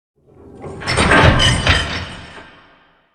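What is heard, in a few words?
big chains sweetener close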